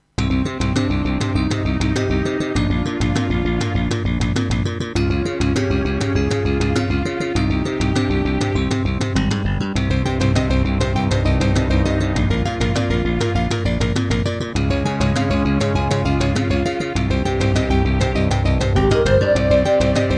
Roland SC-33; Hocus Pocus

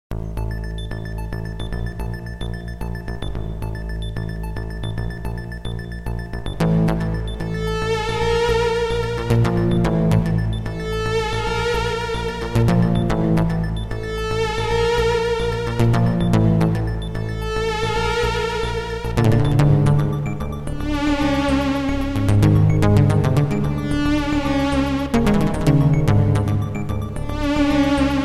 Serdaco MP32L MT32ROM

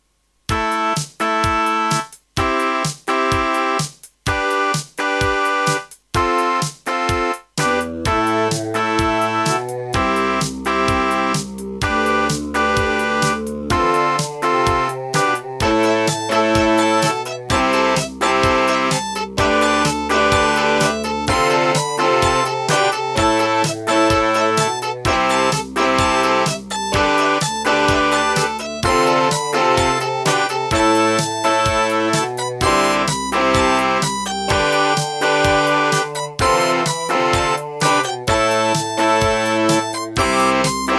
Pine PT-2030 Vivaldi Xtra Wavetable DB; raptor call of the shadows

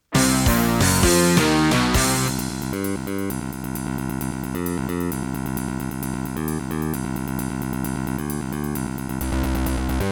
Roland CM-500; sigil doom episode 5

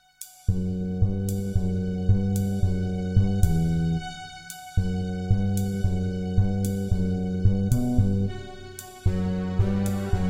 Midiplus MiniEngine USB; Duke Nukem 3D

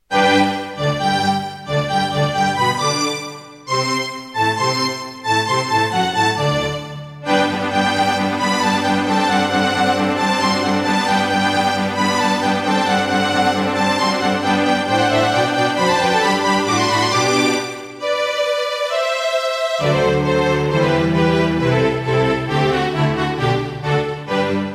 Roland SC-8820 55 MAP; Mozart - Eine Kleine Nachtmusik partial; classical

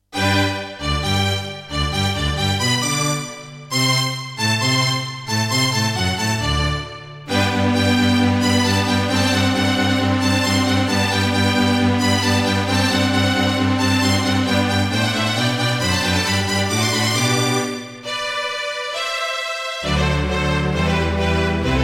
Serdaco Yucatan FX (Turtle Beach Cancun FX Clone; Mozart - Eine Kleine Nachtmusik partial; classical

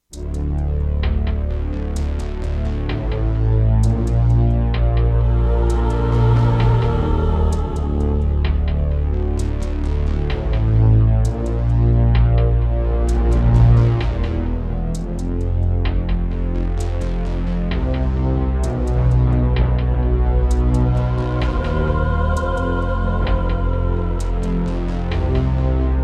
Roland SD-50 GSMODE; Descent II

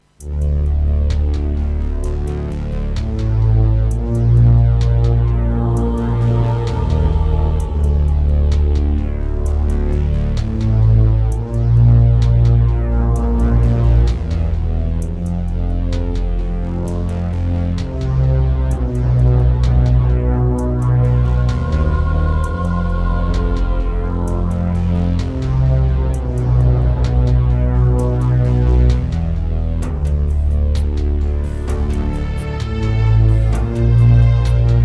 Anteg EasyMidi EM-100; Descent II - Briefing

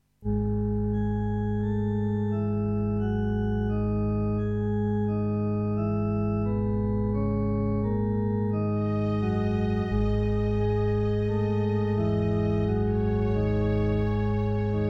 EMU Sound Engine; Azrael's Tear

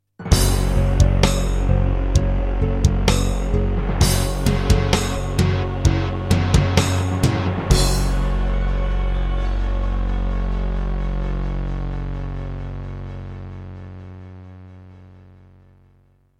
Terratec Wave XTable; Doom (Sigil); Introduction

